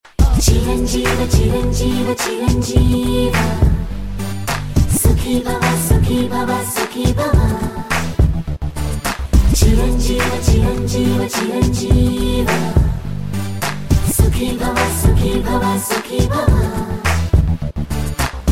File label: Female, Telugu; Badrinath